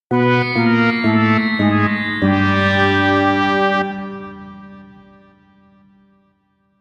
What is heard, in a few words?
Cartoon Disappoint Fanfares